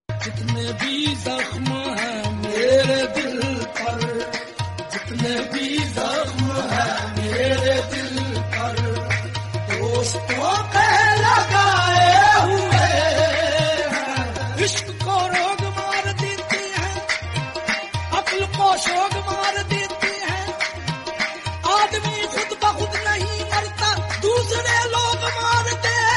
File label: islamik qawwali